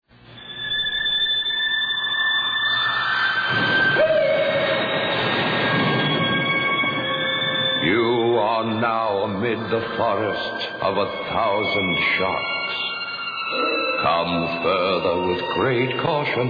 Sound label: Terror